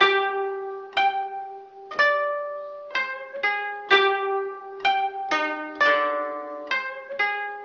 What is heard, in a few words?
guitar